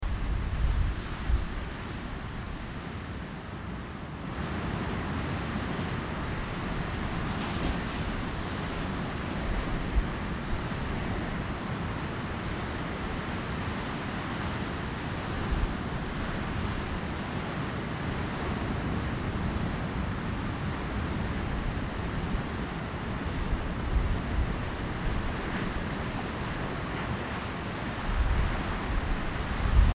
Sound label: Waves